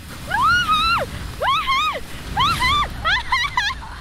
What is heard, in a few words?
panic noises